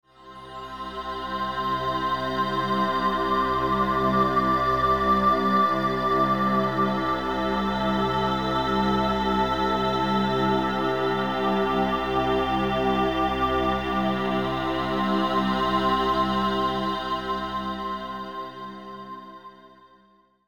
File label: choir